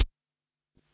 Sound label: Quasimidi 309, Drums